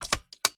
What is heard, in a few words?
Switch PC Down; Game rip; MiSide